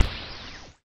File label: ELECTRO BLADE CANON, lasers